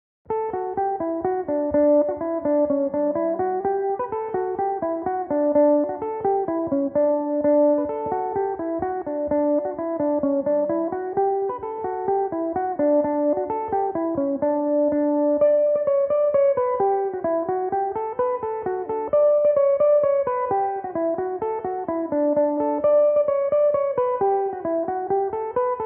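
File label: polka D major, Irish mandolin; The Tettegouche Polka Number 1; Belmuse EM-190 electric mandolin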